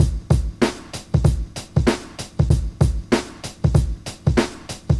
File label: 96 bpm old school hip hop drum loop A key, kick tuned to the A note